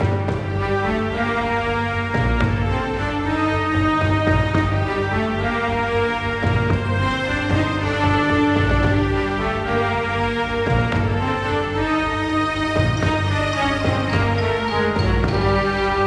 Tension Loop; Might and Magic Clash of Heroes

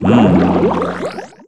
Jellyfish Swirl; Nicktoons Nitro; arcade

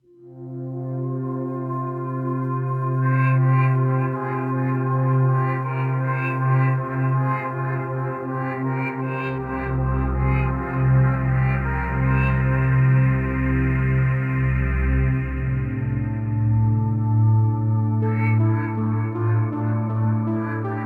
Day Stinger

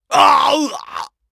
Male Fight Death; mighty party 2017 gamerip pc ios android

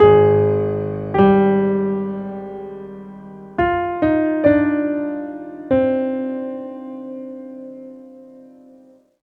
Theme Piano; The Darkness 2 gamerip 2012

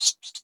rat sqeek

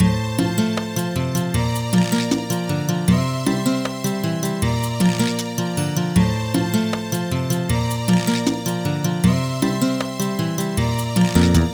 BGM Seaside Arrange; Harvest Moon: Frantic Farming; NDS

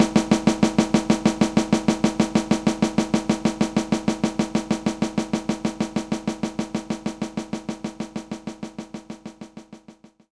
Jazz Snare Soft

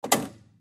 Lever Open

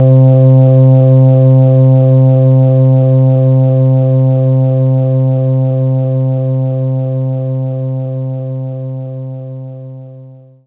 warm pad

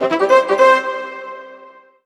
MUSIC EFFECT Orchestral Brass Positive stereo; xbox series xs

